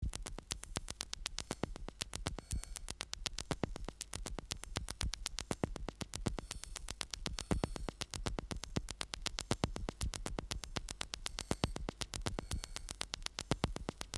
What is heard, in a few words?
Noise Electronic Music